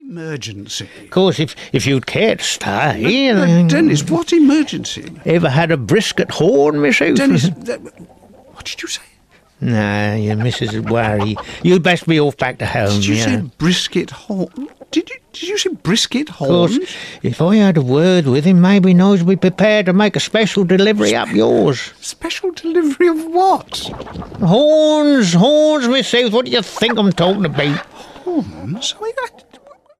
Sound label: Horn